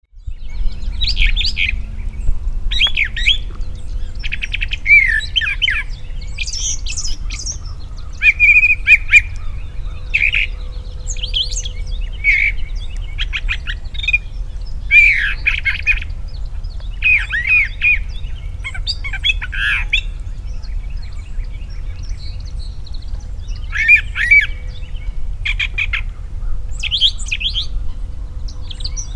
Brown Thrasher; Talbot MD

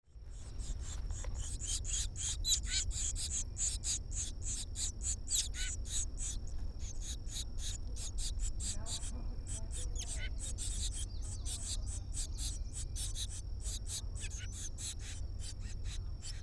Tufted Titmouse nest; KFP MD

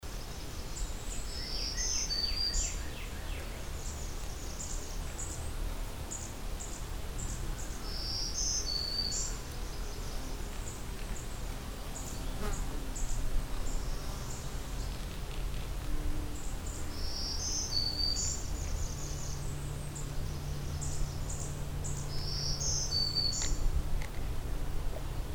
Black-throated Green Warbler; Garrett

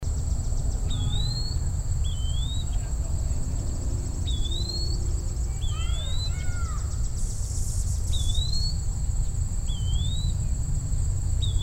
Eastern Wood-Pewee; Fort Smallwood

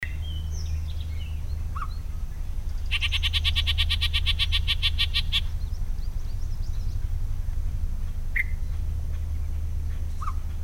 Yellow-breasted Chat, Kent Md

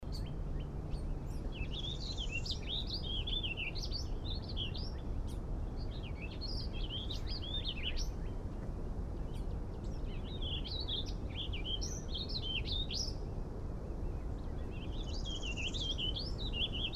Warbling Vireo Spring Migrant; Assateague

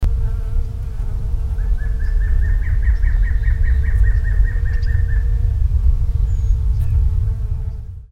Mountain Quail; Riverside CA

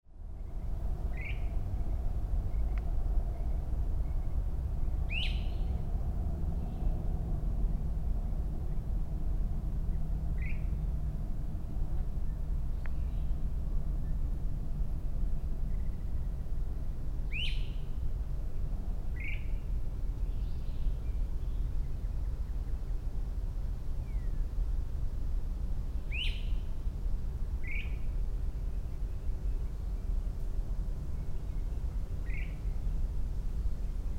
Great Crested Flycatcher; Weinberg MD

